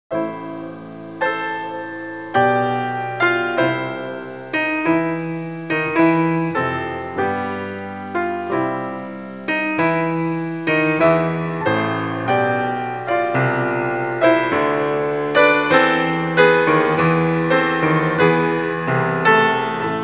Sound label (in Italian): suonerie classica; musica classical